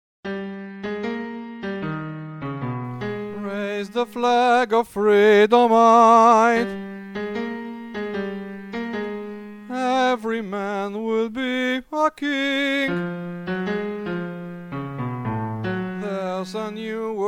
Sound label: sop ten